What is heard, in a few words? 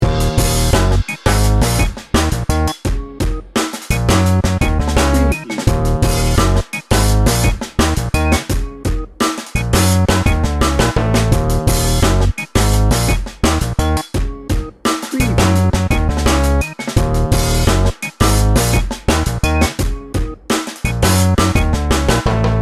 chillout funk loop